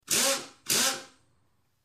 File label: Air Drill Straining